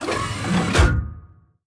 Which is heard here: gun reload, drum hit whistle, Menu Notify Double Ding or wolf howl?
gun reload